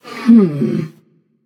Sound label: thinking passion; chaac